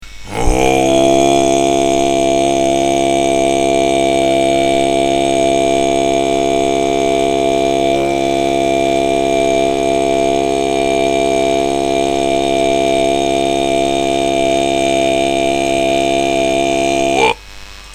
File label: kargyraa